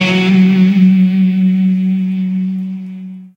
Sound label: guitar End; netent; solomultiplier